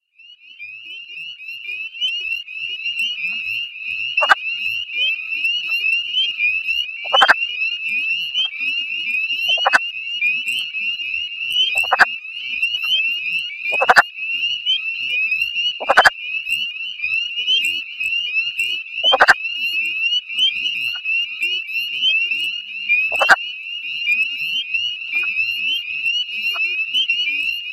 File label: frog, Call a quiet "hoarse duck"; Lithobates sylvaticus